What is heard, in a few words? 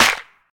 clap single shot b key, Royality free clap sample tuned to the B note. Loudest frequency: 2912Hz; single hits one shots